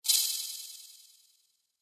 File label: Poison Spell Attack, magic; Magic Sound Effects